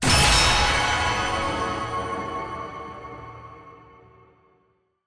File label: UI Event Style Rank S Plus; bloom minigame